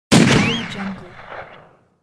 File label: weapon ricochet gun shot